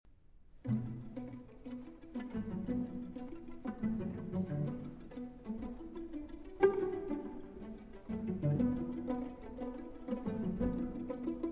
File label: Saxophone Concerto, Jigs, Live